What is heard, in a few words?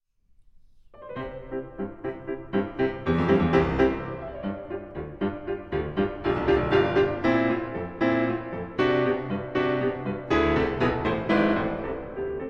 Variations in C Major on a Waltz, Tema. Vivace; Op. 120; By Diabelli